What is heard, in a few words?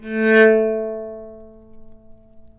A3 Sforzando, Novice Cello